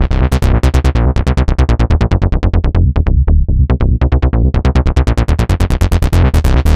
Synth Bass; Basses

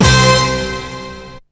HIT, STAB; SoundFactory; SMPMANIA

